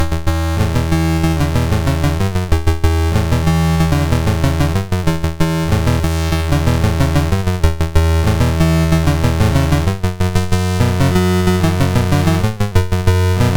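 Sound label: Magicians Ball, MOS 6581 SID chip music, games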